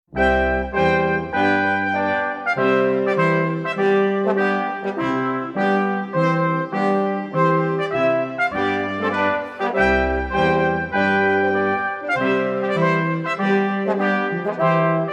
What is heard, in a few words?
Brass Quintet Trumpet Tune; Henry Purcell